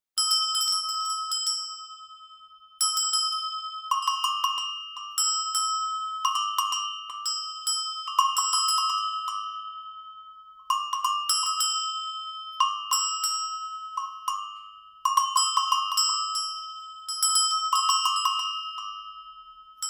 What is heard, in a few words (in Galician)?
chocallos e campas